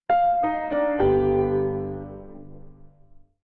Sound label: Logoff - Piano; w2k